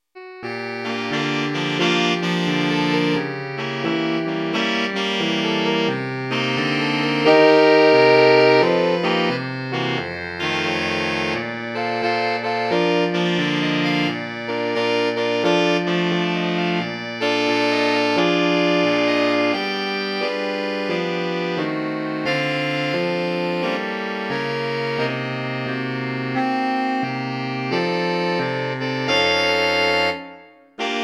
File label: Sax